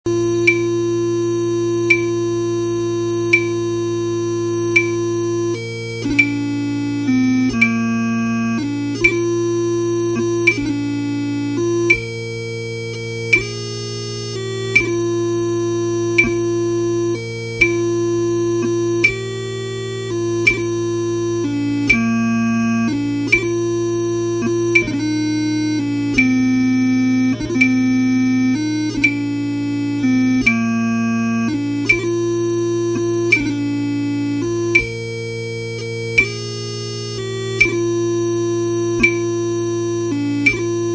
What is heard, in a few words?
Waters of Kylesku 42 BPM, piping; highlanders; piping tutorial materials